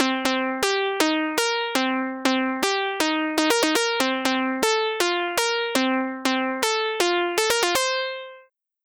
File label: stab